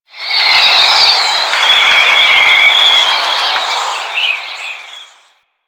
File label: Mountain Pass Wind Gust Whispy; Ambience; Warframe; The War Within